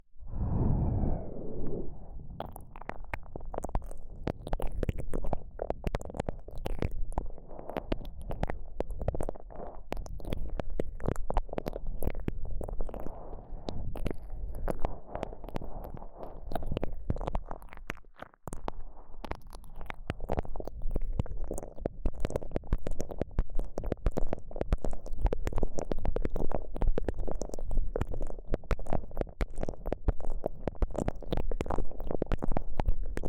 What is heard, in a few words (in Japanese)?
KU100 右耳 奥の刺激が気持ち良い